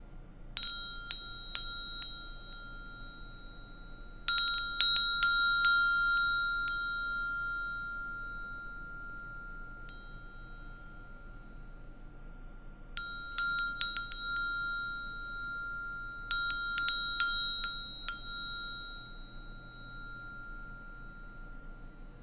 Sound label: Temple bell